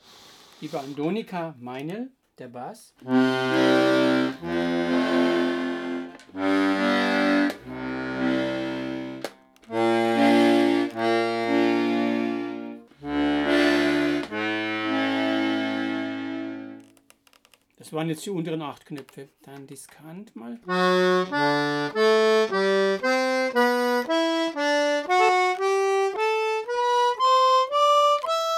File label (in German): bandonika MEINEL GCF tonleitern